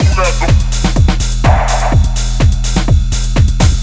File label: Techhouse Kit; Shape